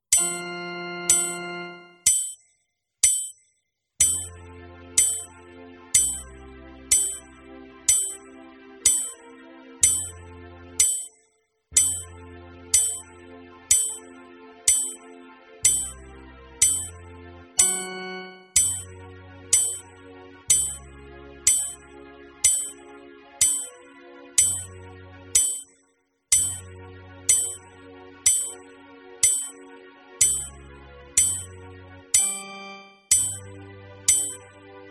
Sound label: warm up exercises